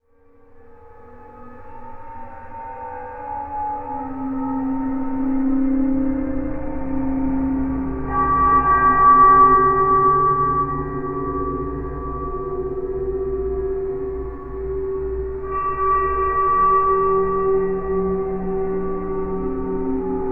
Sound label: Waterphone Bow Slow one shot; Sonic Foundry (Sony Creative Software) - Ambient Atmospheres & Rhythms; Ambient-Metals